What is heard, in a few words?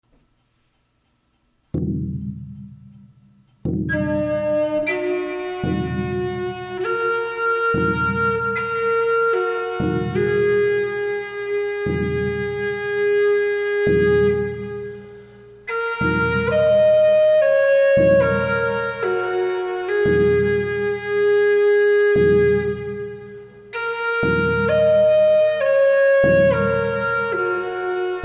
flute